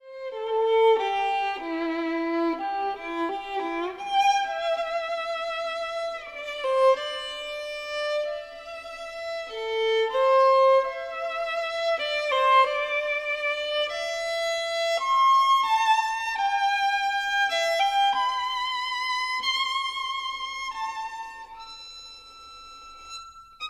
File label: polish caprice